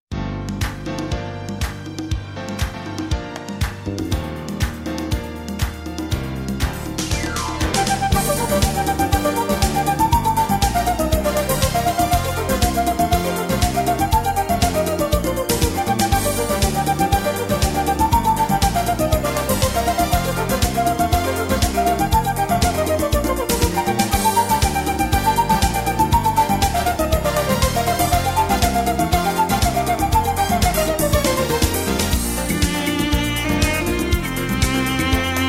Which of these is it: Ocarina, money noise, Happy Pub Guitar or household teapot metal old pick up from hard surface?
Ocarina